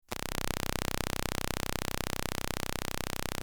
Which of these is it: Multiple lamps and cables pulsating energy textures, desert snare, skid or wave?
Multiple lamps and cables pulsating energy textures